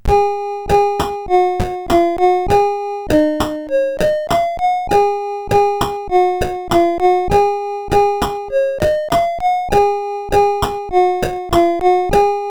8-bit Ending Theme, sid, CSG8580R5

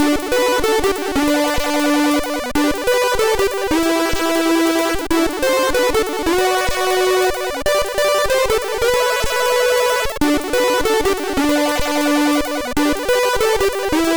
Merry Xmas sid MOS6581R3

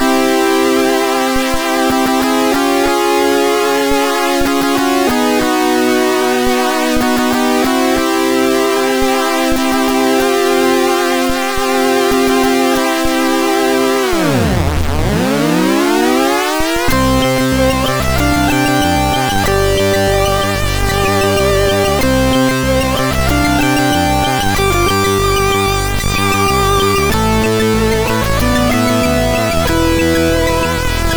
sid MOS6581R4; Another Sound

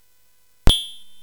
Dark Castle, CSG8580R5

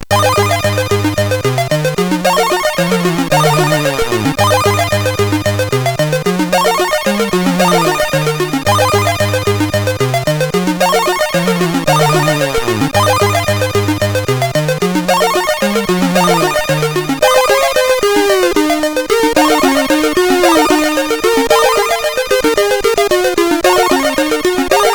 Digital Fantasy, CSG8580R5

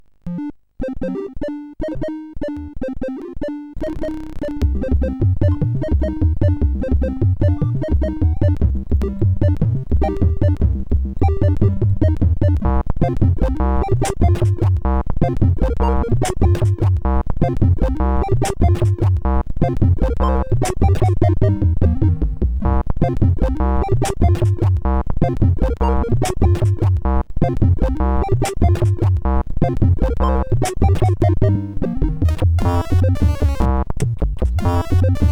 Jazzpjazz sid CSG8580R5

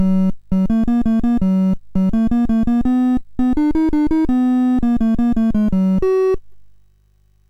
MOS6581R2, GAMES; Sammy Lightfoot

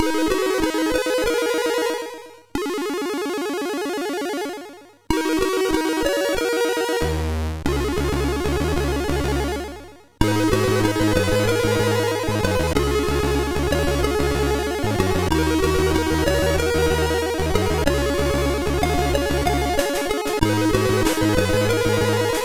note sid MOS6581R4

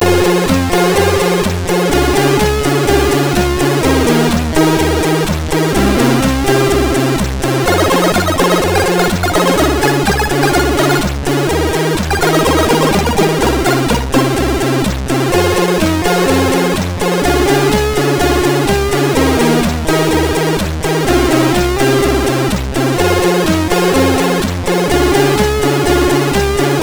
Intro Music, MOS6581R3

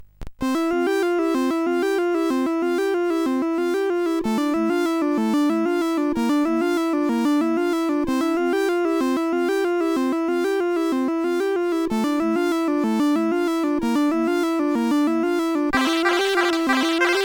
Ocean Adventure, CSG8580R5

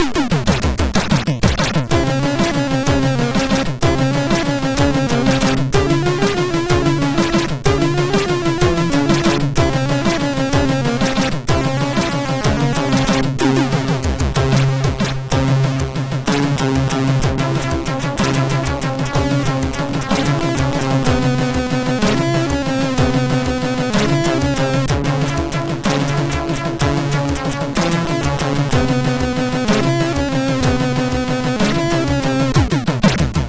Iranian Music, MOS6581R4

